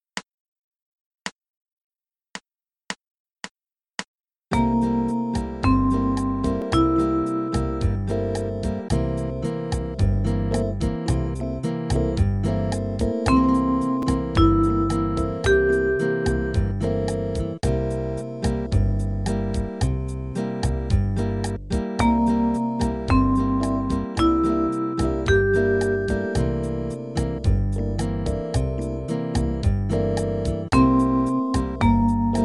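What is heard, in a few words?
Dom7 Third Inv, arpeggio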